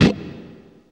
stroke, guitar; East West; Steve Stevens Guitar Samples Collection

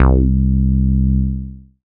c, Euro Style, Dance Synths

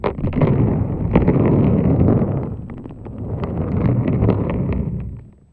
Plastic Tub, contact mic, Marbles; musicradar; contact mic madness; Raw

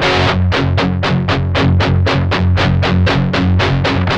8TH G 2, Guitars; Spectrasonics; Hans Zimmer Guitars VOL-1; 128-JACKHAMR

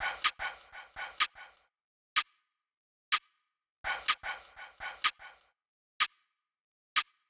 Fx Loop 125; Equipped Music; Loungin House; Perc Loops 125

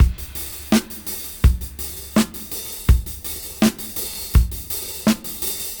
Gresy 82 BPM; AMG - Keith LeBlanc Phat Butt Jams

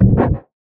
Percussive Hit, contact mic

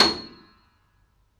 FF C 7 off, Grand Piano off mic; AKAI S6000 CD-ROM - Volume 2

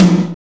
TOM, Drum Dry; East Collexion; Drum 1

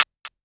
muted clap, Korg ER-1; Kit 10

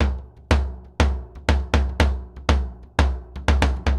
BOMBO, DRUMS SAMBA; Best Service ProSamples vol.14; AKAI

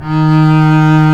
STR, Cb Bowed, f vb; Roland L-CD702; Cb2 f vb

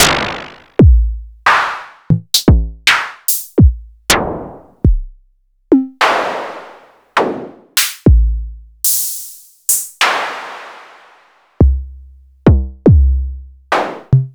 Novation Bass Station 2, Samples Sliced; m8

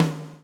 swing

